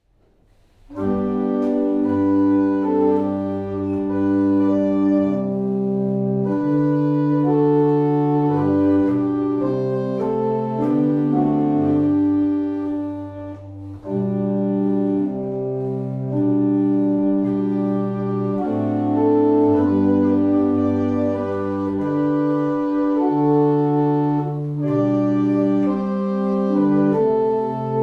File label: Abingdon, barrel organs; Steeple